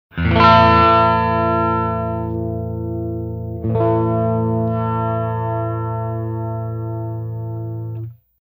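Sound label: electric guitar tone knob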